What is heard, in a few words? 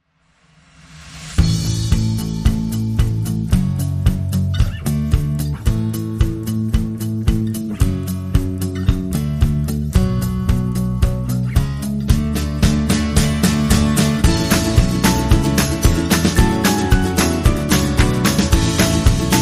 Indie Anthem